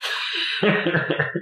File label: Laugh